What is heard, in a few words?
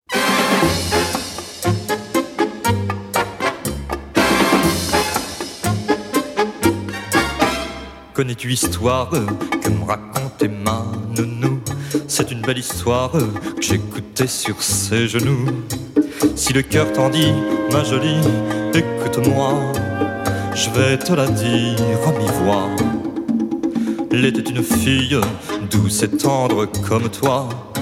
Cha-cha-cha